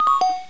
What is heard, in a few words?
start